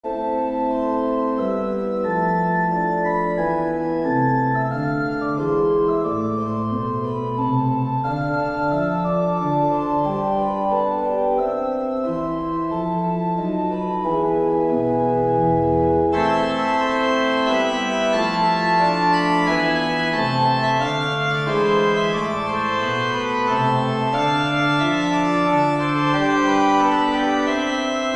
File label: Organ Miniature, Sarabande in A Minor; Gottfried Grünewald